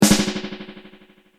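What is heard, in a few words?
snare ad202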